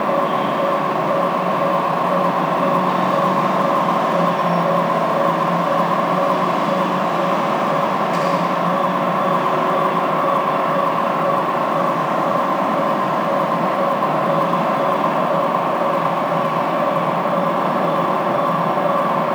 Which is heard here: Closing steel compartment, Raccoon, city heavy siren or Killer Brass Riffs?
city heavy siren